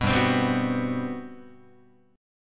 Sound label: G Major 11th down Guitar; strummed; JGuitar